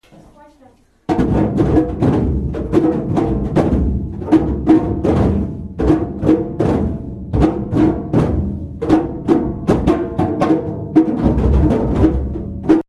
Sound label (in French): tambour